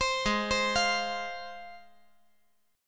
G sharp 6th; arpeggiated; JGuitar

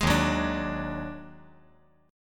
D sharp Minor Major 7th Double Flat 5th D sharp

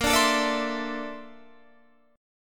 A sharp Major Sharp 11th, Guitar, Standard; A#M#11; strummed; JGuitar

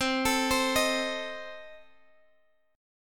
C Minor 6th Guitar Open F, chord; Cm6 arpeggiated; x,19,21,19,18,x

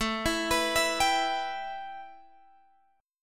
A 7th Suspended 2nd, A, down, Guitar, Open G

